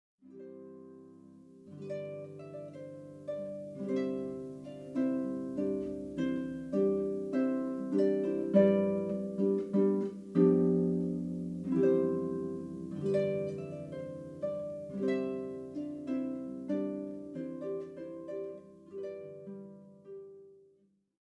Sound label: Trumpet Voluntary